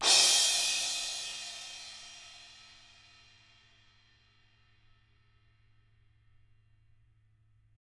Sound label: ZILDA MALLET